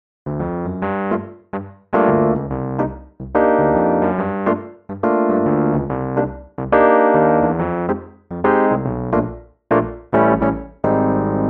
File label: Korg Grandstage EPiano Tine Mark 1